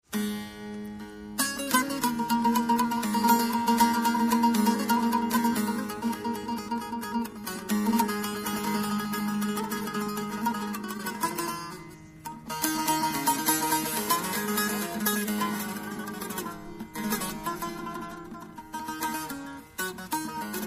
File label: Kurdistan